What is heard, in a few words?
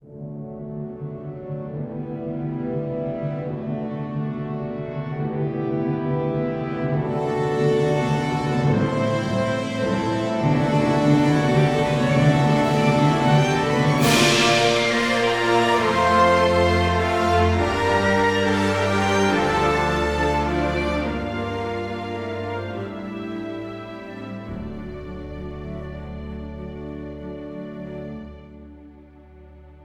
musescore